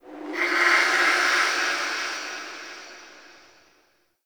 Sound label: Zariman Whisper Air Draft